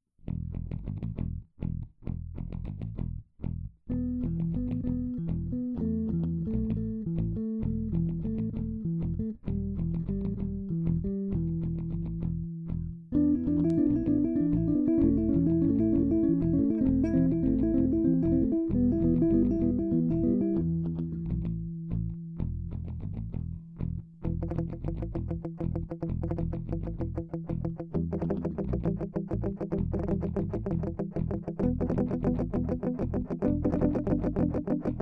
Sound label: Warwick Tracks Tone High